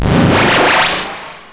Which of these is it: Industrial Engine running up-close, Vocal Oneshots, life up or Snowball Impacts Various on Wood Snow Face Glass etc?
life up